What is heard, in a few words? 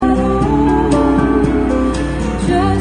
fill